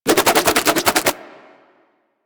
Nunchuck Quick Spin Whoosh; Warframe; Infested

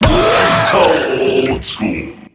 Pain

